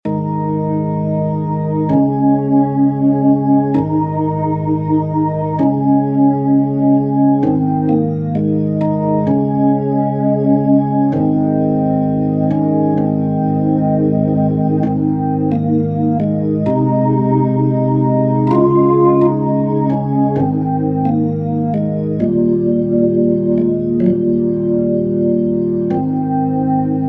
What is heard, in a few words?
Underscore PAD; Worship Flow